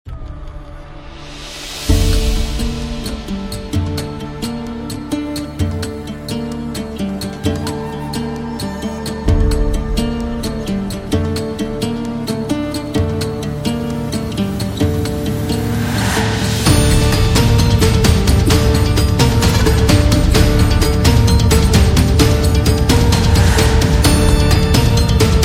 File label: Oud